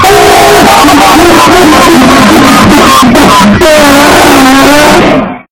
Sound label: devil laugh